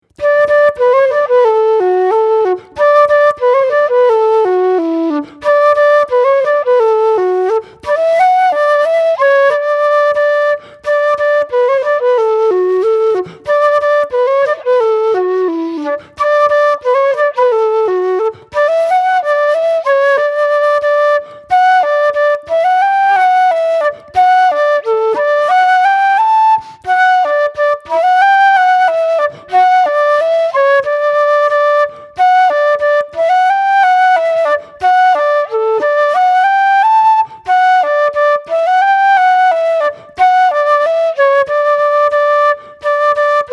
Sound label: Polka Slower; Armagh Polka